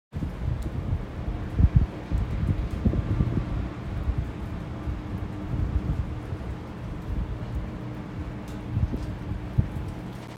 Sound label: wind but cleaner